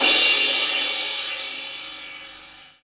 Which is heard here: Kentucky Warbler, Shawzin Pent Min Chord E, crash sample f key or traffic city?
crash sample f key